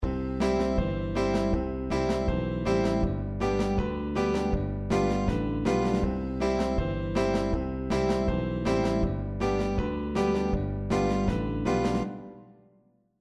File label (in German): Bandpattern Country; Popularmusik